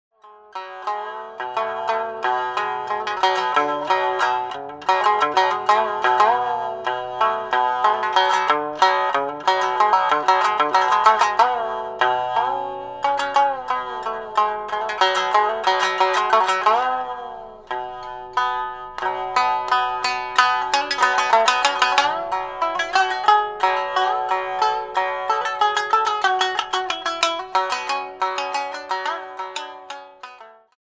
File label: shamisen solo